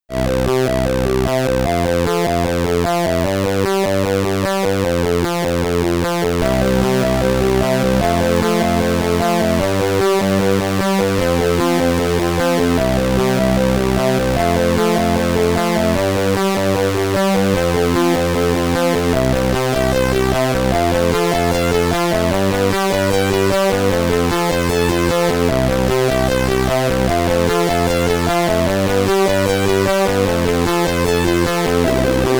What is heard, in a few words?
Name Entry, SID Stereo; Quedex; Commodore 64